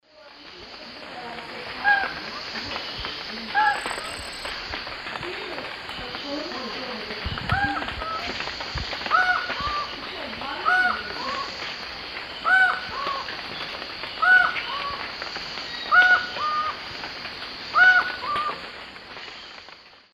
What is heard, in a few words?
Herpetotheres cachinnans, calls; S. America; Eastern Lowlands